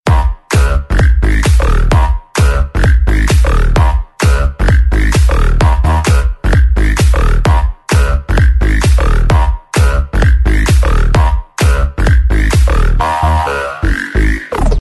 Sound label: Melody